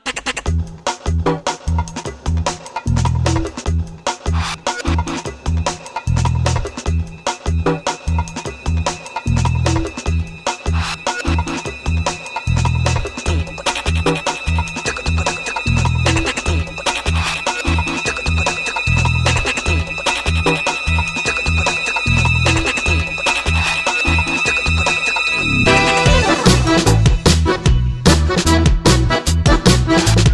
electronic beat

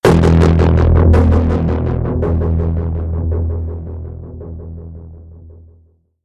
sting; doorstop

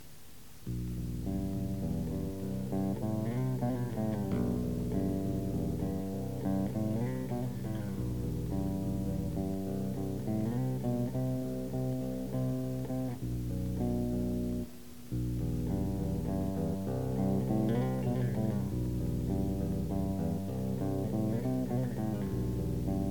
bass solo

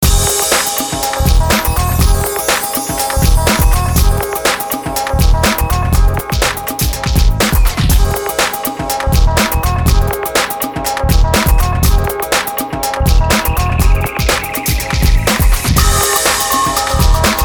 funky sound

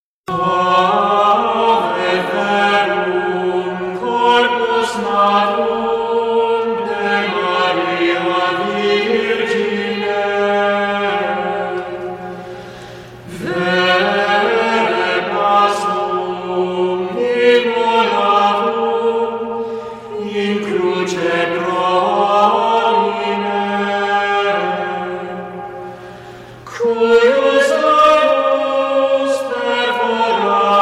Gregorian chants

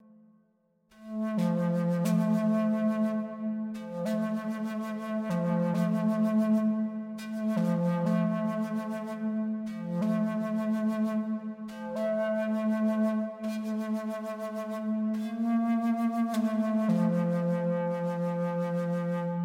native american flute

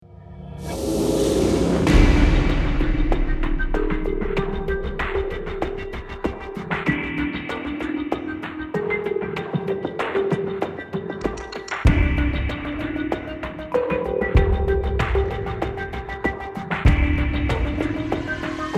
drums

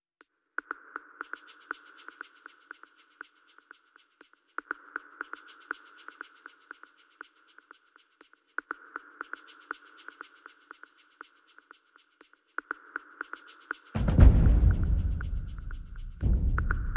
perc only

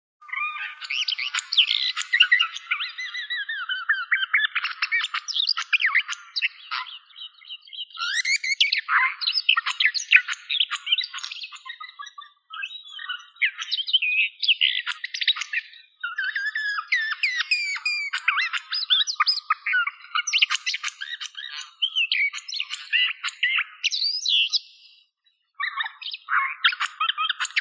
slavka yastrebinaya sylvia nisoria

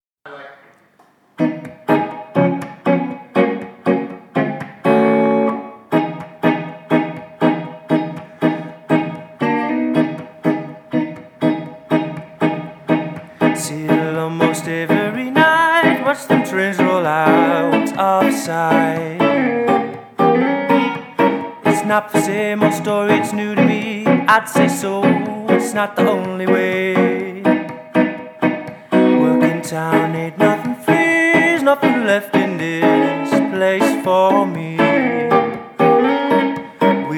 vocal version

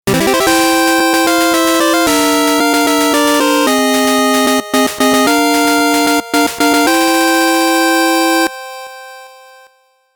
game clear

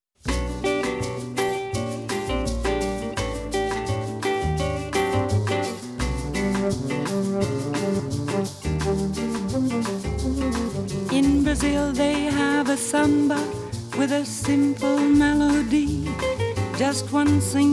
samba

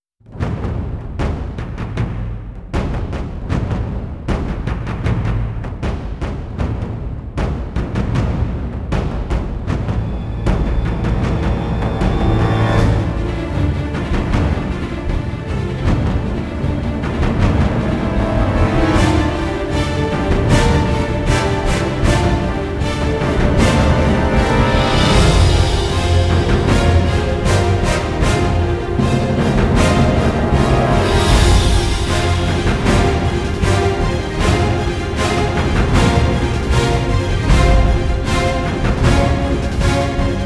non choir

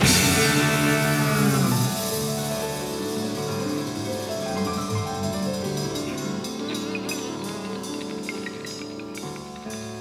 piano solo

